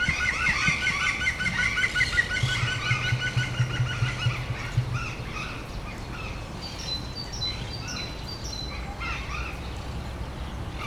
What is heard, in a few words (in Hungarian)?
dobol az emu, madár fütty, zöld küllő; debreceni zoo 2012